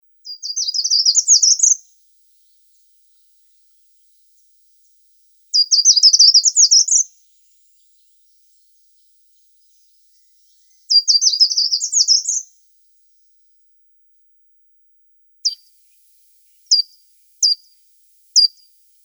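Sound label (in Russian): Pishhuha korotkopalaja, short-toed treecreeper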